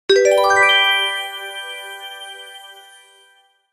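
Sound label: quiz sounds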